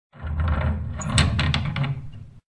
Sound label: Coffin